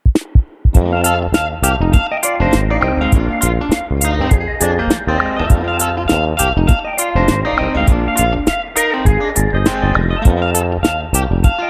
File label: fretless funk; weeklybeats 2024